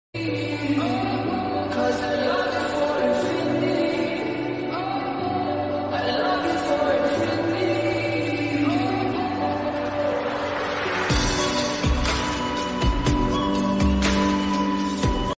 attitude ringtone, english ringtone, flute ringtone, love ringtone